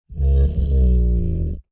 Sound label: Creature Large Moan Exhausted; Monsters; Horror; Big Room Sound